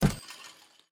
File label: hot spot bounce left; riches of midgard